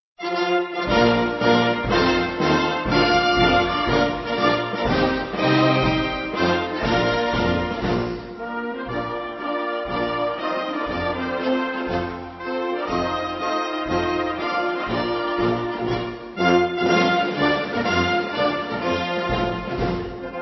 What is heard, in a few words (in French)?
marseillaise instrumental; Scoutisme de Baden-Powell